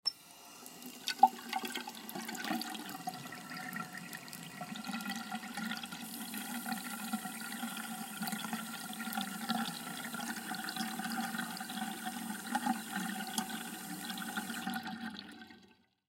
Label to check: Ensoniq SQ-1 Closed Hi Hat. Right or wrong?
Wrong